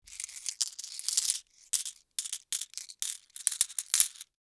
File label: coins in hand